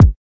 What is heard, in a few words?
kik; Kicks; single hits